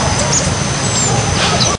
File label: bird